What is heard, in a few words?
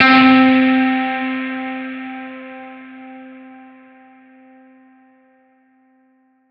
FreeFall Guitar C3; Aubit; Awake Vol. 1 Serum Noises